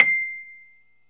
Rhodes 73 C; E-MU Producer Series Vol. 5 – 3-D Audio Collection; 3DRhodes

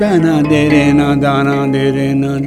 Classical, Vocals Male; Sonic Foundry (Sony Creative Software); World Pop

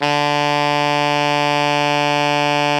alto FF D 2, saxophones; Greytsounds; Philip Wolfe David Crozier's Saxophones

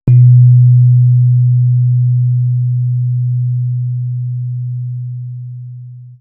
Vibe; Coldcut's Kleptomania VOL1

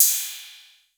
HIPHOP KIT CYM; Wizoo - Platinum 24 Electronic

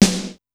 SDS2000 SN; SDS2000 Factory3

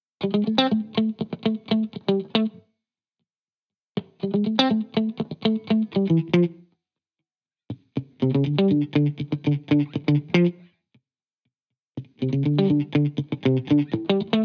Guitar Bpm120 Key A minor, 70s Guitars; Fox Samples - Back to the 70s Guitars

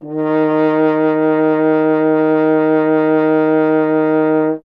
F. Horn; Miroslav Vitous - Solo Instruments VOL-2; Solo lnst. II

